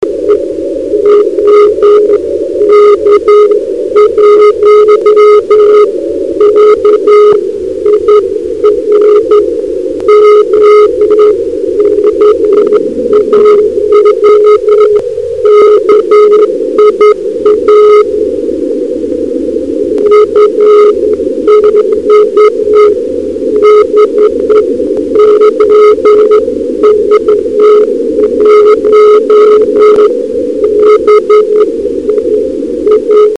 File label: EME 2320; 13cm